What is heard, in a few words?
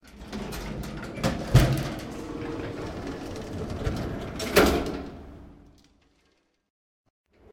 Elevator Transition; backrooms 2d android ios online windows gamerip